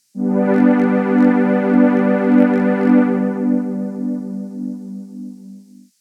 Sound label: Strings I; j106